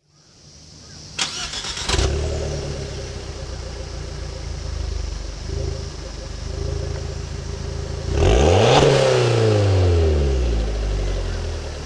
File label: exhaust; muff; mito exhaust